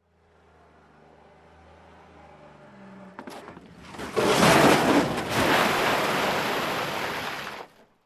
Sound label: Car Crash on Scrap of Metal